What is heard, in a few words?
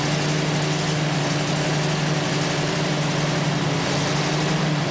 drive, vehicle